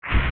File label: Enemy Poof